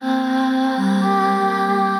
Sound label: Chorale Swirl, Choral Voices; Ableton; Factory Packs; Voice Box